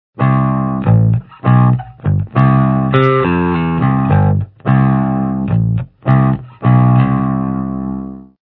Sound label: bass distortion